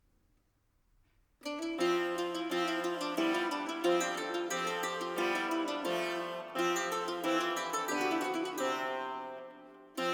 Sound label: Salve thronus Trinitatis (Instrumental); The Krasiński Codex (Fifteenth-Century Music from Cracow)